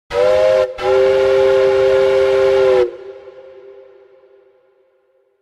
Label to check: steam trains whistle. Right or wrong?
Right